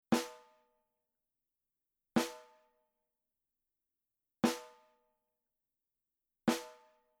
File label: SNARE dry Edge Soft; GeneraHD